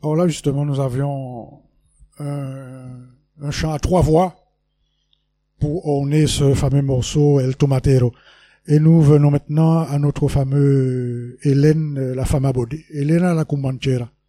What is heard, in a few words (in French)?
Un chant a 3 voix; Le Son, un chant harmonisé à 3 voix